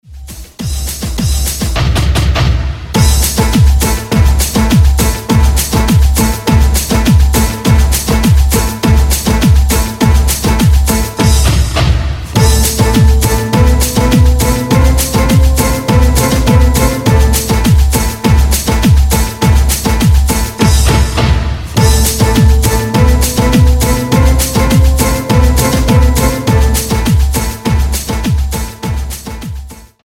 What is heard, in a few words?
SAMBA Steel Drums (51BPM)